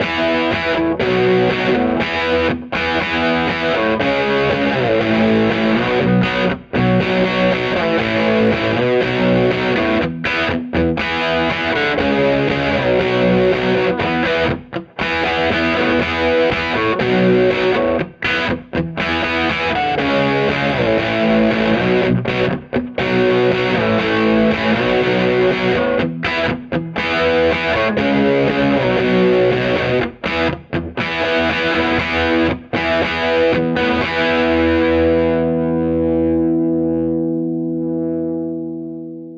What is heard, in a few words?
solo